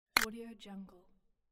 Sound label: button click